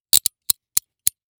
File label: Weapon Handcuffs Tighten; AdobeCC